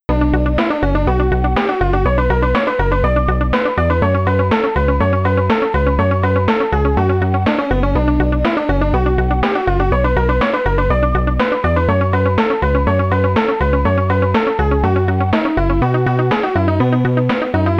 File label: Rondo Alla Turca; Lemmings